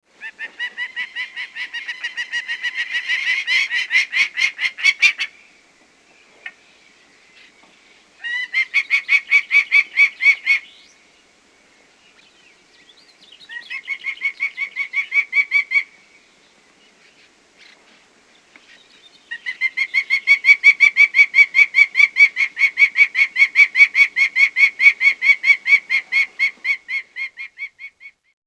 prairie falcon

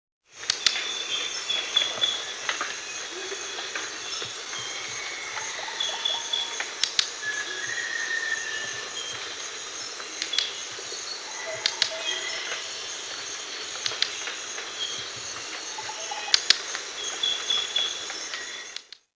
green kingfisher

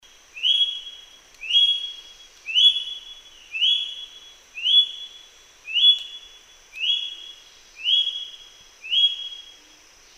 moluccan king parrot

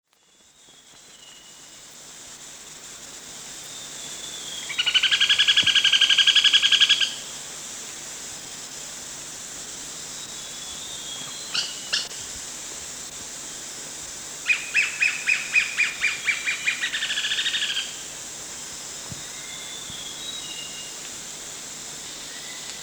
buff necked woodpecker

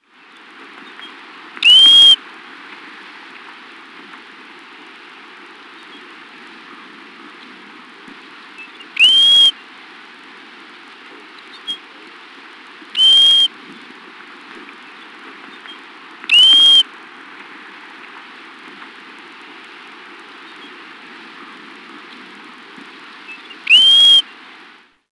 pacific golden plover

